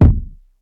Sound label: fluffy steel kick drum sound a key